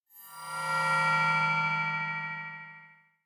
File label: Metallic Low Breath By Slow Danger; Hollywood Edge; sound designer's toolkit v2